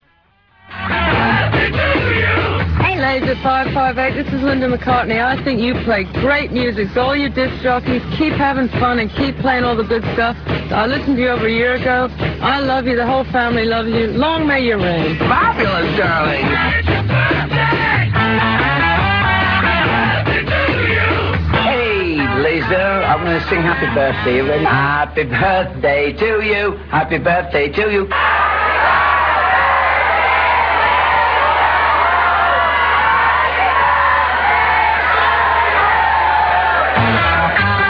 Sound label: laser